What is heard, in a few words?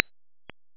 gummibass; Kaktus